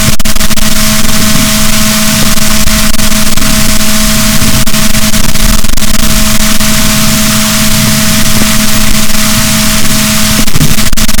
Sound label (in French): rorquals, boat, Balaenoptera Physalus; SNR 18dB